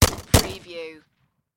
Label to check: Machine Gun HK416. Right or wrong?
Right